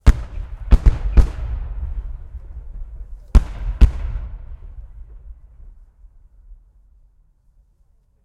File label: tank battle cannon fire stereo; Sonniss GDC